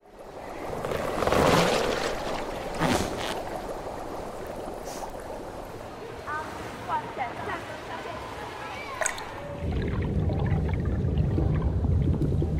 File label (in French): Clapotis